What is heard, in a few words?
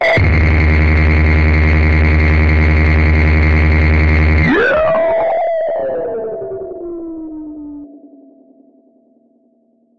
insane megabass; Basses